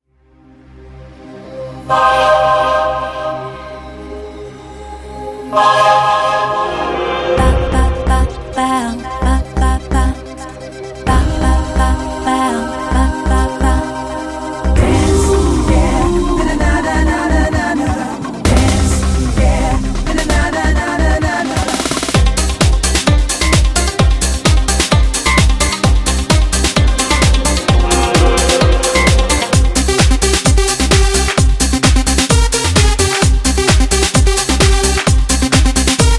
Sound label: Pub Music